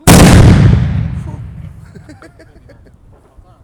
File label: Carbid explosion giggle